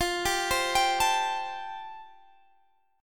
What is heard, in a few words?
G 7th Suspended 2nd Suspended 4th F chord